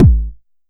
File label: TR909 kick, Roland SH-32